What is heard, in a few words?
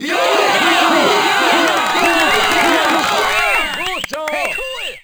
goal, Crowd German